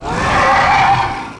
dragon

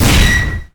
iron shot

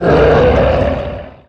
scream

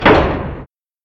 door

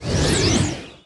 airstrike

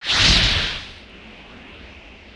freeze

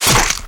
bite

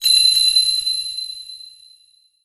bell

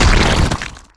slime

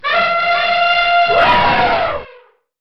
elephant

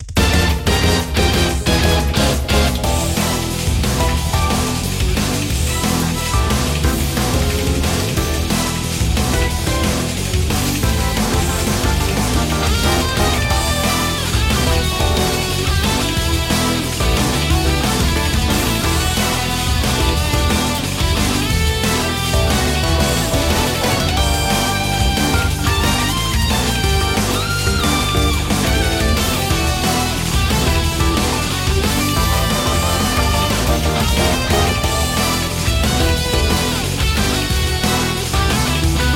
BGM DUEL

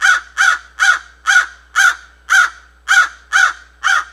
raven

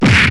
punch

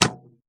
bow

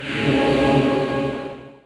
Pup

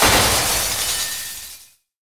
mirror

